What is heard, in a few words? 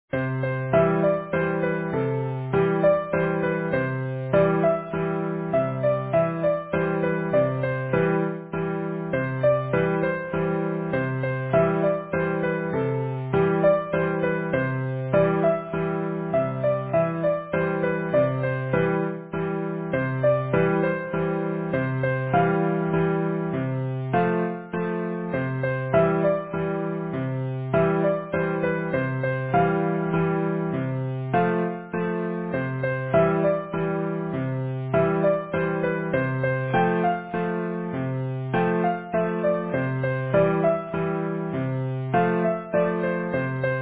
Easy Piano